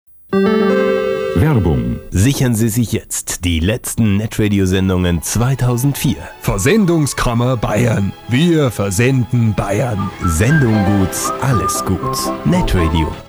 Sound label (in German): NR Jingle; Bayerische Versendungskammer